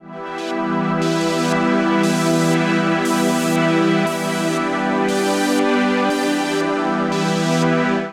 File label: Pad (Em) 118bpm; Music Loops; Gracious Fields; Ableton; Singularities